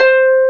HARP C4; E-MU Formula 4000 Series Vol. 5; Protozoa; Proteus2 Instrmt

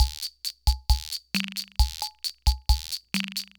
Loop, sr-95; classic machines

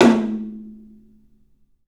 TOM, 3D Drums, CLUB TOM; 3D Sound; CLUB 08TOM

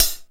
OHH, Roland JX-305

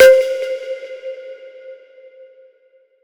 Pluck 12 - C; 99Sounds; Monosounds; Music Loops; Instrument Oneshots

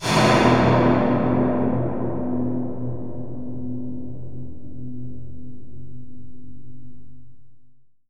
SCIFI STEEL; Rarefaction; Poke in The Ear With A Sharp Stick

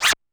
Dance Kit, Korg 01W

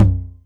Tom Lo, Novation Drumstation 909

